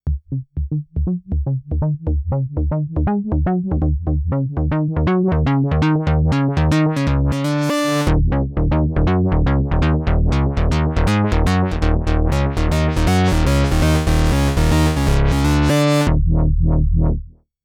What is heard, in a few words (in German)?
Elysia skulpter 500 Synth Kompressor Medium